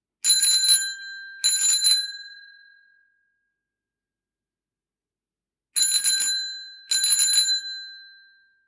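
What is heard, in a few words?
bicycle ring; افکت صوتی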